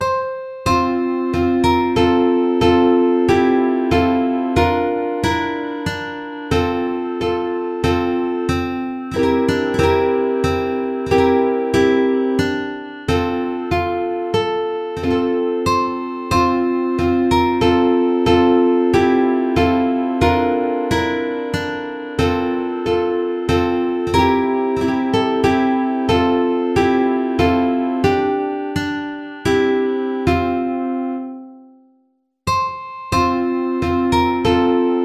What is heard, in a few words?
Away In A Manger ukulele